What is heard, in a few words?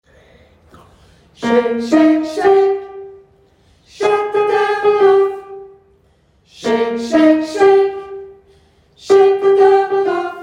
alt, gospel; kongsberg; kor; gospel for alle